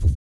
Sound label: ELASTC TUBA, Vocal Blues Soul